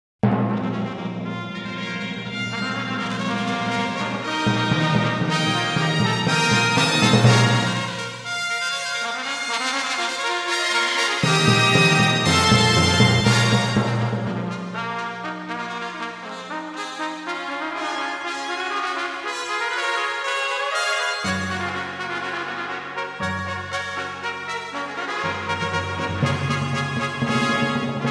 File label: Fanfare for 7 Trumpets